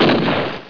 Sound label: fire; netpanzer